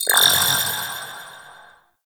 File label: Pylon Proj Nearby Ping; Enemies; Weapons; Warframe; Arachnoid Camper